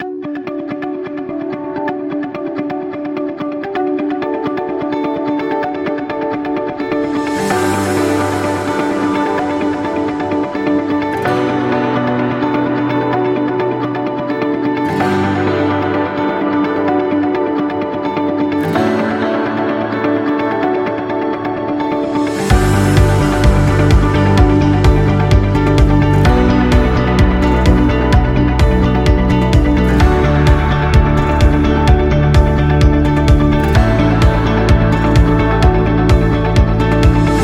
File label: Indie Pop